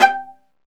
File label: STR VLA, Viola Solo